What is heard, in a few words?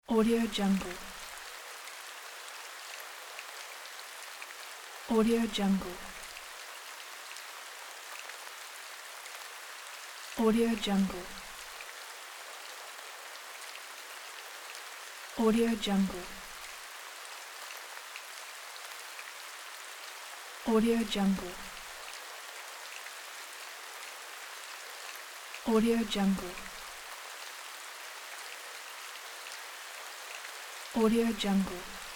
light forest rain